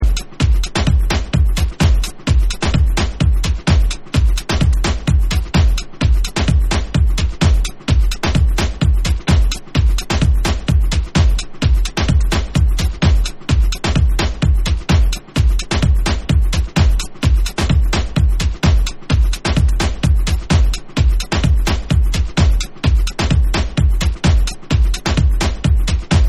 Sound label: Beats